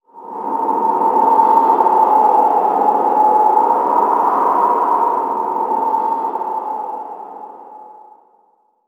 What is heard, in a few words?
environment wind stinger; Aragami